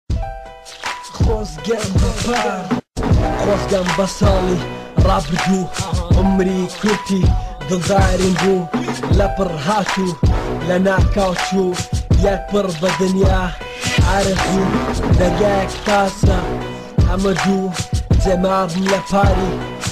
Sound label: kurdish Rap